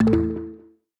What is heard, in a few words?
Call Leave; Medal